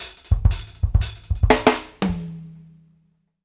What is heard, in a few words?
SWING HAT3 L, Drumworks FILLS 84; Sampleheads; New York City Drumworks VOL-1; KD FILLS 84